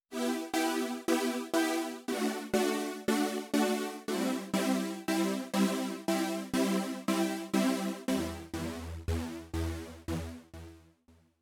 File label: hard shuffle pulser trem